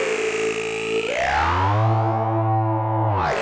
SPACE FX 140; SpaceSounds

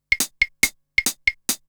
TECHNO PERCUSSION 140

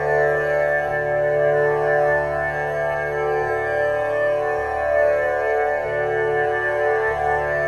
ethno, 125, A

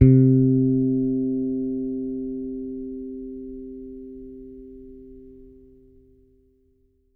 T-BIRD BASS FINGER C3; Bass Mace; DirectWave

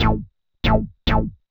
SNTH BASS ELCTR 140 A, Techno

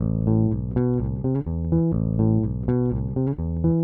DEEPHOUSE BASS 125 A

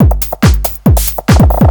TECHNO BEATS 140

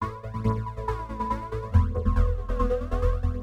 TEKNO 140; Techno2

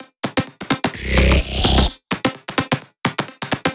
drum loop 128 Perc; Electro; drum loops; Anthemic

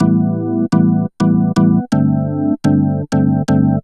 DEEPHOUSE ORGAN 125 A

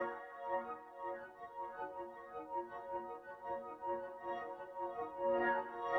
SYNTH PAD ETHNO 160 C, Sphere

